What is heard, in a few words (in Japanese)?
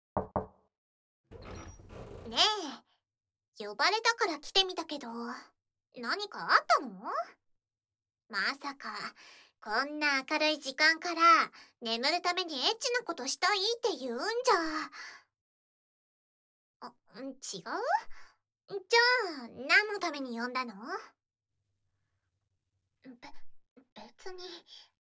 おまけトラック・耳舐め右耳